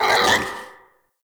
Battle Dog Pain; Animals; Vocals

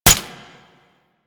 Mech Full Auto, Pistol; Weapons; Grineer; Amphis

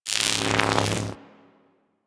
Infested Arc Rifle Fire Random D; Warframe; Weapons

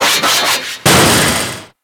Foley Locker Open Rip; Enemies; Kubrow; Warframe

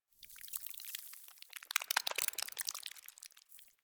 Ext Spine Move Close; Ambience; Misc Outdoor; Warframe; Infested Eris

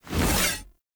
Two Handed Axe Whoosh Heavy; Weapons; Enemy; Axes; Sinecure Two Handed Axe

